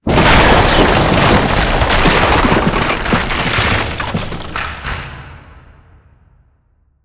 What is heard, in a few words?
Explosion Rock; Boomtown; Titanfall 2